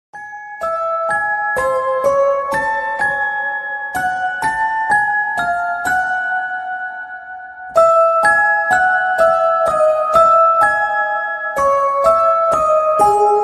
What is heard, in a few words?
BGM Piano Ringtone; Raja Saab